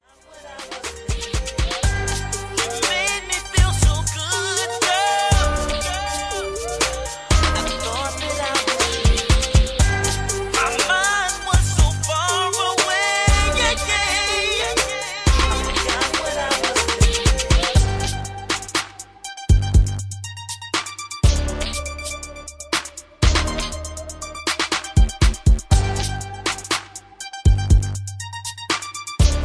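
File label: 60BPM With Hook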